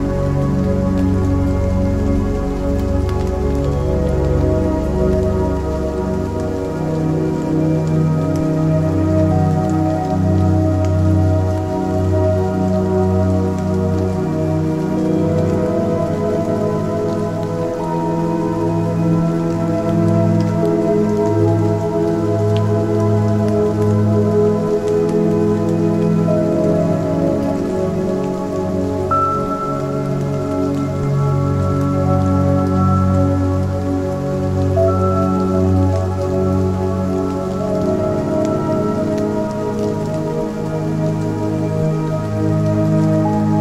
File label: Heavy rain sound in nature; Zen Music